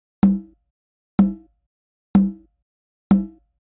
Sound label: Conga; techhouse